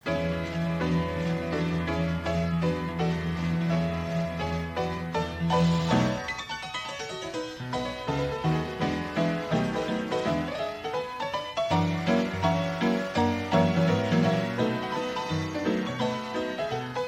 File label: wurlitzer; WURLITZER STYLE 33 MANDOLIN PIANORCHESTRA